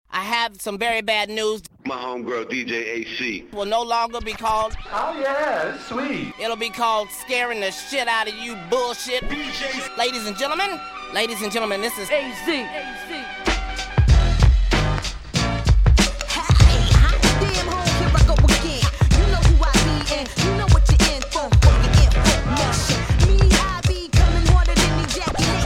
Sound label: even a lady can scratch